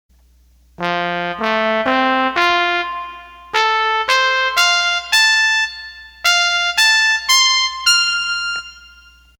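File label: Trumpet, Roland accordion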